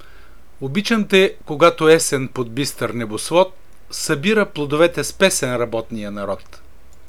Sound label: kuplet